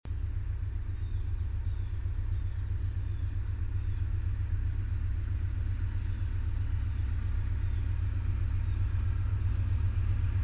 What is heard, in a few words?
Amtrak, olofonia